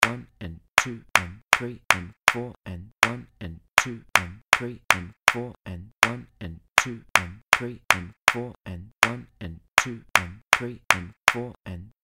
8th Note, guitar

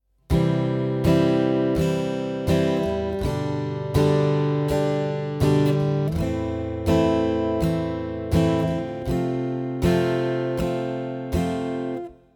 Intro Chords

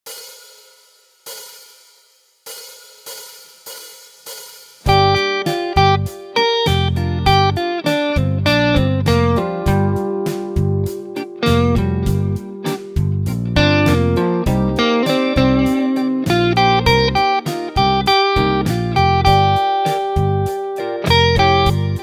Minor Blues Solo, guitar

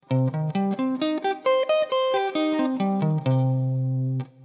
C Minor Arpeggio Shape, guitar

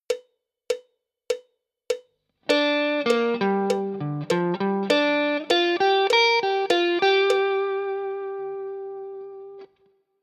G minor pent connecting lick, guitar

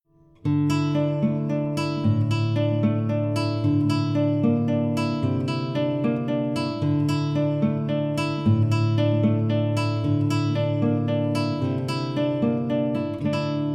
Right Hand, guitar

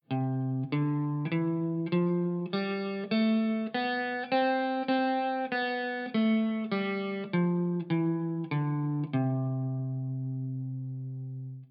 C Maj Scale Good, guitar

